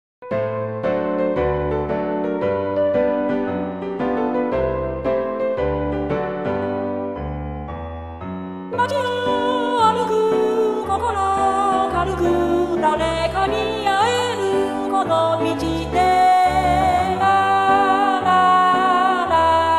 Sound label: chor